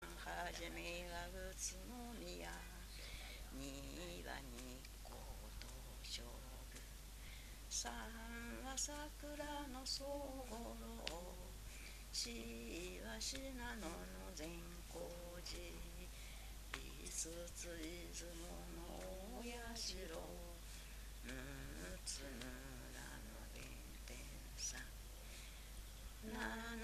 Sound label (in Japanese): itibanhajime, minyou